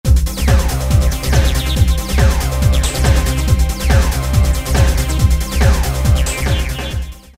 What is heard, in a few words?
Hard Trance